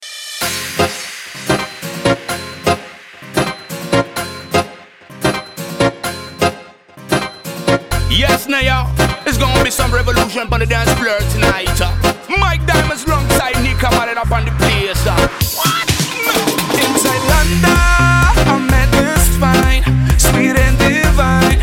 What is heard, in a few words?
Housemix